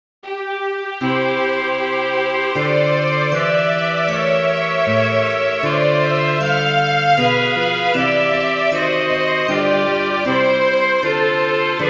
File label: orchestra; o christmas tree